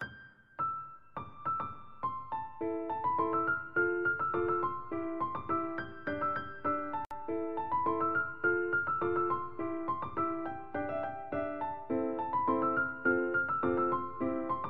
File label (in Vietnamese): Nhac nen cho PowerPoint vui nhon